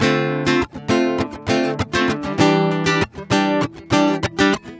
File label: GUITAR SAMBA